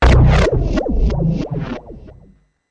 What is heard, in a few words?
Whomp Fall